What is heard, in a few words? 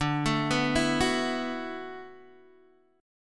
D 6th Add 9th, Guitar, Open G